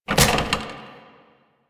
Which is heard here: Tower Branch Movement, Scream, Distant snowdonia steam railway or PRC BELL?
Tower Branch Movement